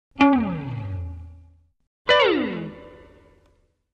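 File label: string accent, 2 variants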